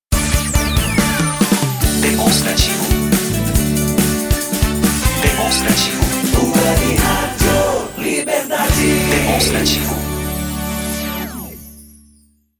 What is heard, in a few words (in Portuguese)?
Radio Web Radio, jingles vinhetas cantadas